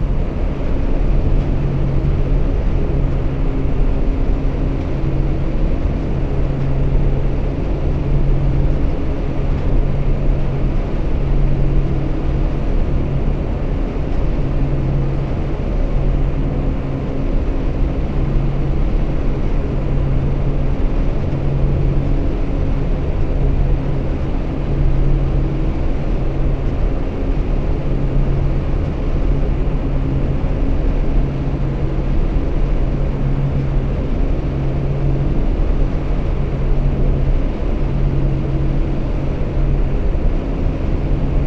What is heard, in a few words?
ambient space pirate station; Stellaris